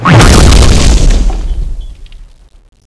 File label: attack; Teddy CSO; cstrike